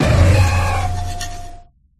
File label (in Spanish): Grito de Ondulagua; Gritos de Pokémon de la novena generación